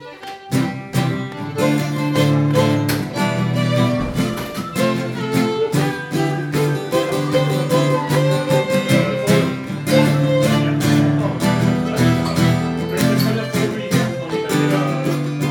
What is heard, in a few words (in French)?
plinn rythme guit